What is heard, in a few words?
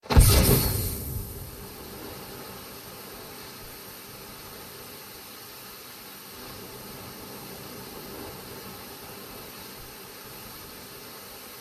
Smoke Bomb